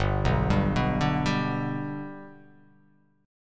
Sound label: G Major G down, chord; G arpeggiated; JGuitar